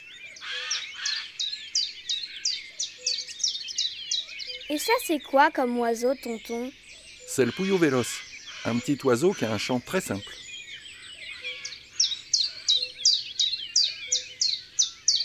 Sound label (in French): Le pouillot veloce; cuicui et compagnie